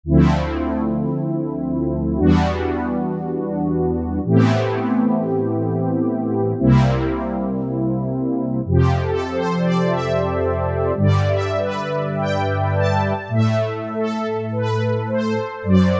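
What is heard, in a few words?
Brass Oberhorns, synth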